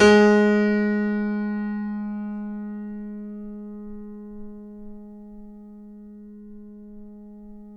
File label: FF G#2 off, Grand Piano off mic; AKAI S6000 CD-ROM - Volume 2